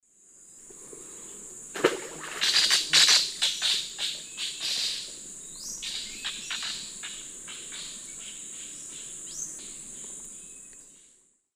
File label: Chloroceryle amazona, dive and flight calls; S. America; Eastern Lowlands